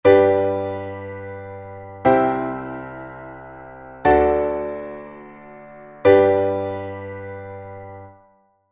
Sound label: G major three chord